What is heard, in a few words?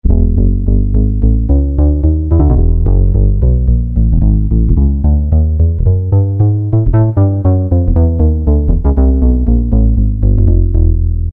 Uprite Bass, Roland Alpha Juno-2; Factory B